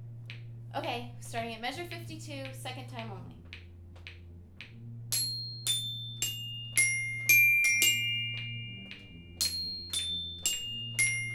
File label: Mallets; Bayside